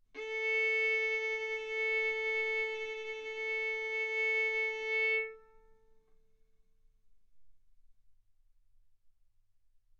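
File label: Violin arco mf A string A4 B4 stereo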